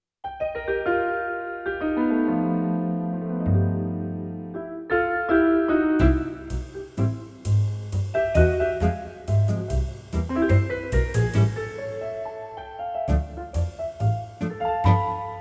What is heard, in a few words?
Tango Vibraphone, accordion